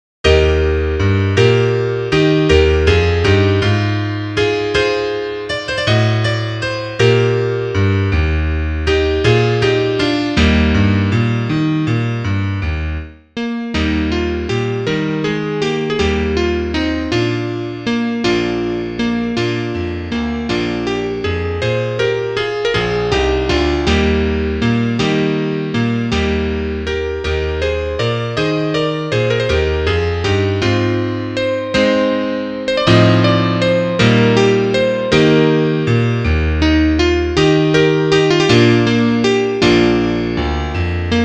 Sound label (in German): Klavier Solo, trompete; Lied und Tanz